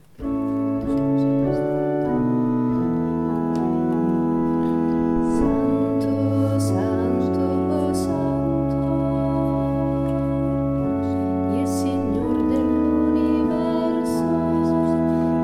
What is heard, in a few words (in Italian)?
Santo CONTRALTI